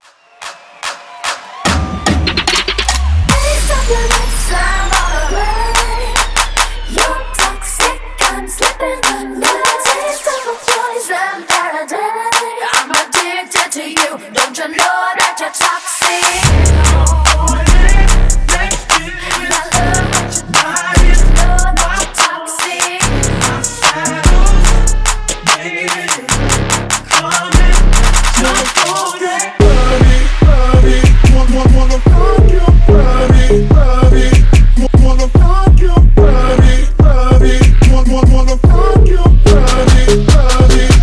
acapella intro

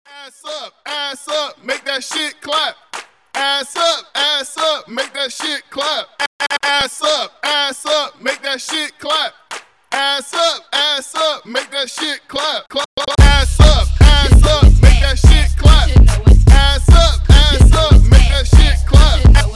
acapella intro; short edit

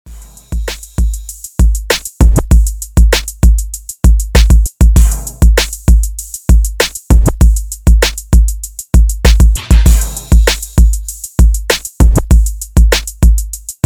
remix

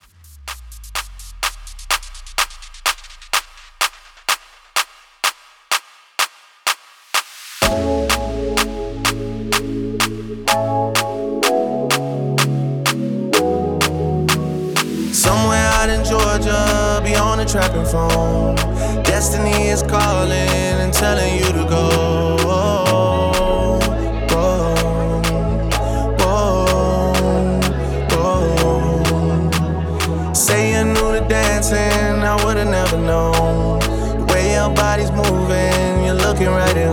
clap intro dirty

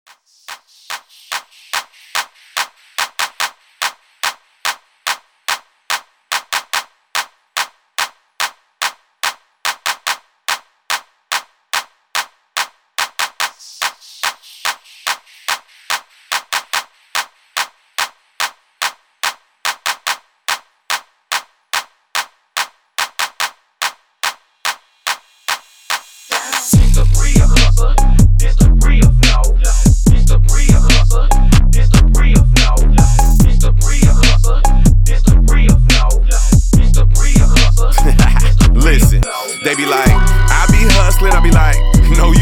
clap intro dirty

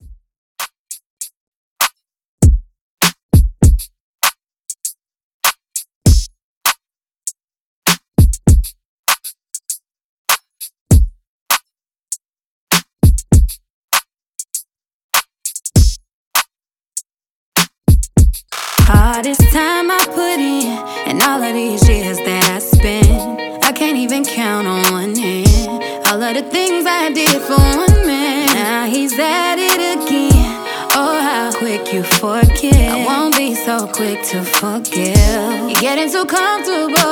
beat intro

